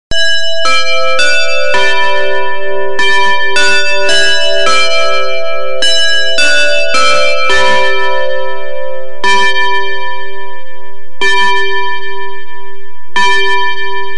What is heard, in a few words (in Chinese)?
上课, 信号类